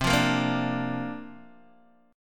C 9th Suspended 4th, Guitar, DADGAD

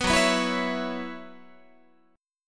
Gb 7th Suspended 4th Gb down Guitar, chord

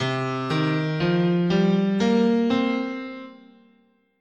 C Minor Pentatonic, scale

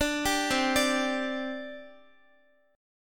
C Suspended 2nd, C, down, Guitar; Csus2; arpeggiated

